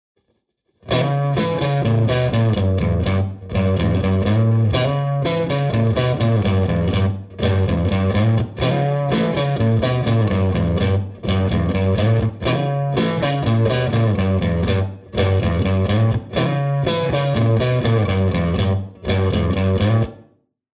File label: getaway guitar